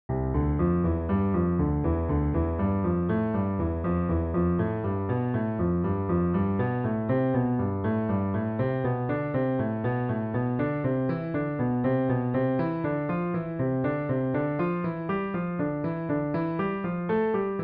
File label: hanon exercise, piano; Charles-Louis Hanon (1819–1900)